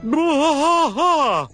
bwaaah

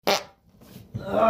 balloon fart

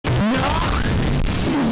gamer screams; sweaty

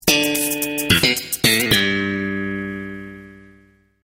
sitcom transition bass riff; seinfeld

